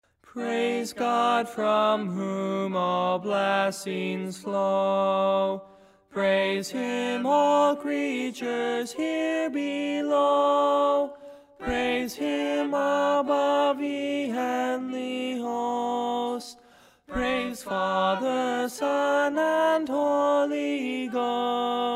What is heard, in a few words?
Doxology Tenor; audio files for the different parts of the Doxology to be prac; Gospel Church